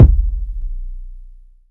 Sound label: Not Nice Kicks